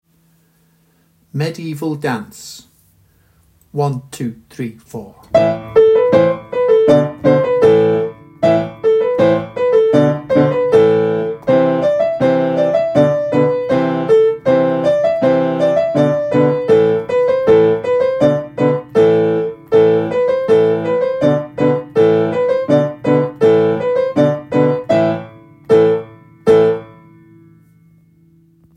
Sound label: Medieval Dance